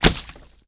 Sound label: flesh